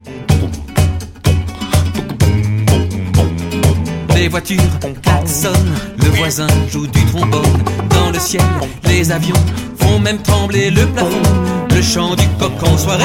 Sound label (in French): Funky Bruit; MiniZoreilles